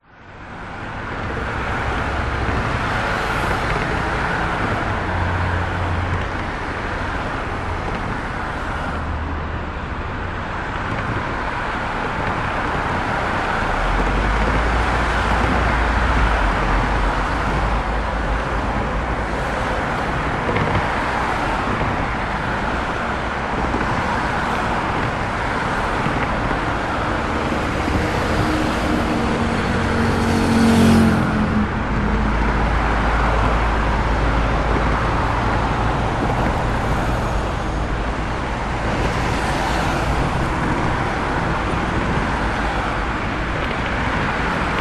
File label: brisbane cbd traffic victoria bridge above motorway some pedestrians